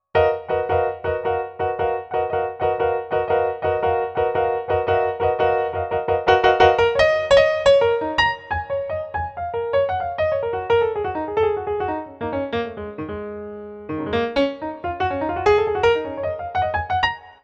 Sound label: Piano Solo, Cinematic Funk; Cinematic Funk Urban Tone Poems